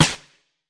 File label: fx drum, Electro FX